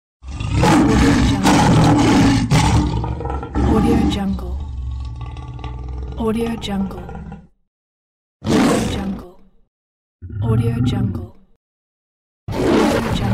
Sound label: lion growl